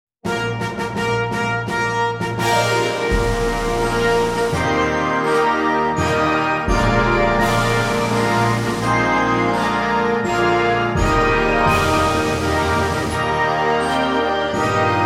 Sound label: Happy Birthday To You - Band